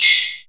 Perc FX, Percussion

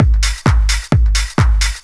dr t 130 trance 2; LOOP TRANCE; DRUMLOOPS

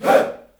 "HEY", Heart of Africa, shout; Spectrasonics; Heart of Africa 1; CH. SHOUT1

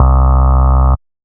Dist Synth Bass; Big Fish Audio; Old Skool House; OSH Bass

